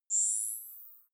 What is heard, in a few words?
zoom in light; signale